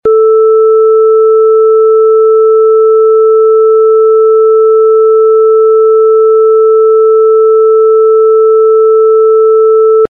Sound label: Sine 440Hz full scale